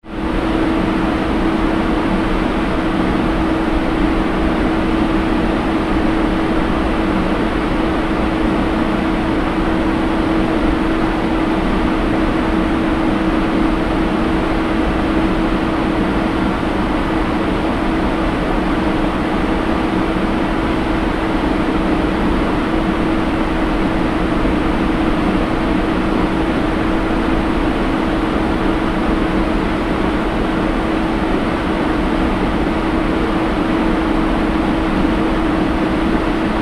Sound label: cruise ship engine room ambience